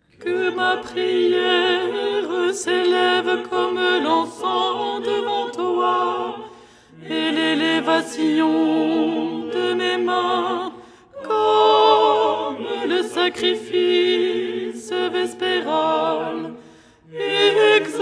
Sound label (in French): alto